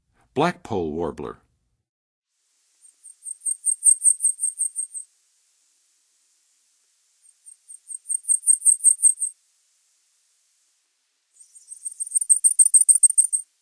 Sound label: Blackpoll Warbler, Songs of Birds of North America; Western USA; Western USA Songbirds